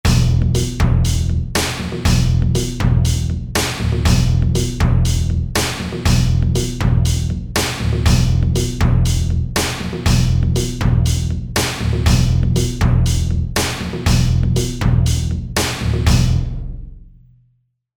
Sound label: TripHop